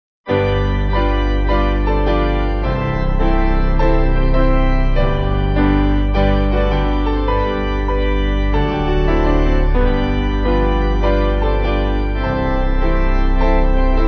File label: What A Fellowship, Piano Organ